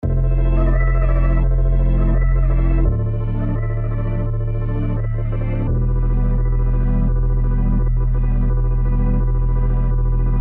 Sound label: 170BPM